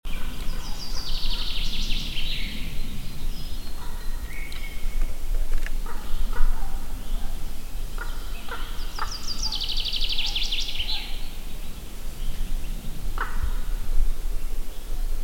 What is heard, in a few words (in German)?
kolkrabe